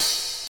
dark open hat sound c sharp key, Royality free open hat one shot tuned to the C# note